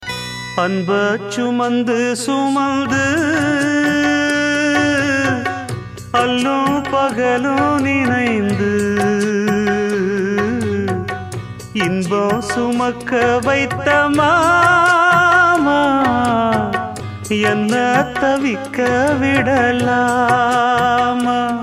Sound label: tamil sad